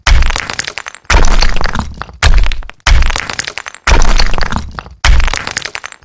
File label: crusher crushing